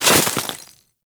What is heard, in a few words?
Melee Impact Snow; Warframe; Weapons